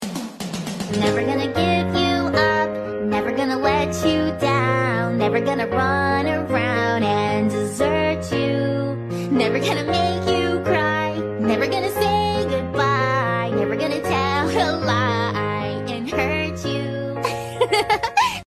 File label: acoustic; monika version